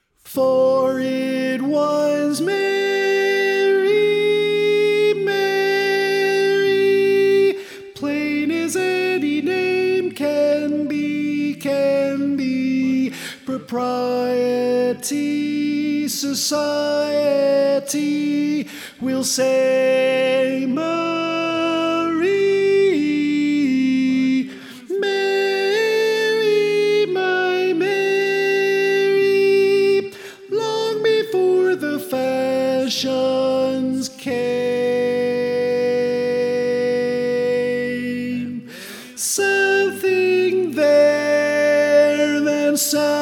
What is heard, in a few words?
Tenor